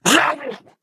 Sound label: ballbot blown up